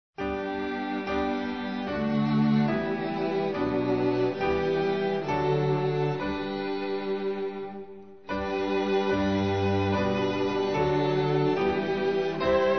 praise god from whom all blessings flow instrumental; hymns portuguese